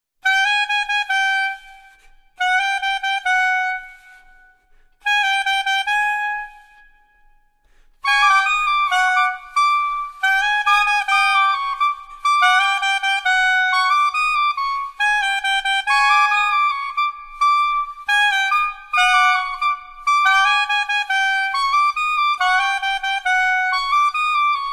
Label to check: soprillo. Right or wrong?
Right